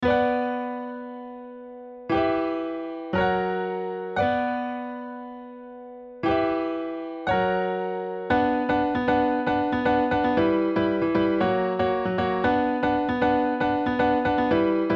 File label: piano steinway solo; Logic